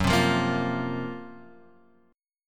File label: Gb Major 7th Flat 5th Guitar Open F; GbM7b5 strummed; JGuitar